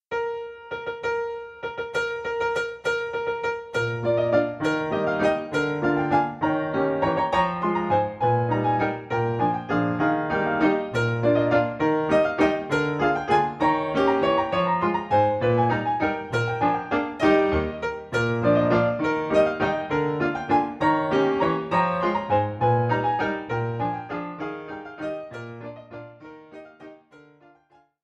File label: battement glisse on a 3, grande valse brilliante; frederic chopin